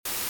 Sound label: static